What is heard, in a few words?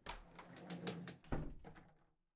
Open Door; Game rip; MiSide